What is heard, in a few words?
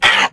hurt, aliens; ufoai